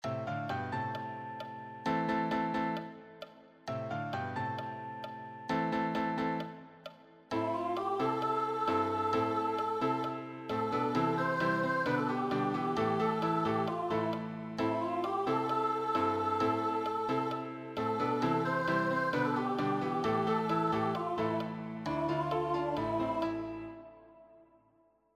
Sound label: accompaniment